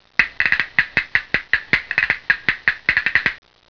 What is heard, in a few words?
Joy, happymusic; 特酷声音素材库; 背景音乐